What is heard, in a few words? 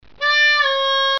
bend, harmo